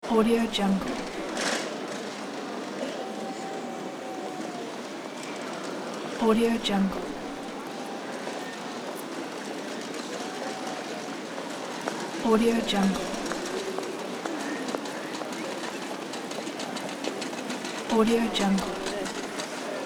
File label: bus station